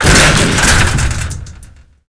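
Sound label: destruction file cabinet metal; Explosions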